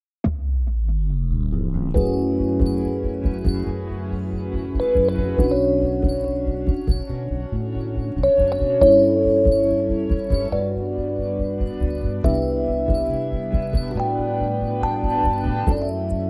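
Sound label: D smooth, Backing Tracks for Male Vocals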